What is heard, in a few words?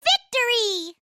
victory